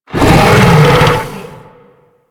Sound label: Infested Bone Blade Vocal Soft A; Warframe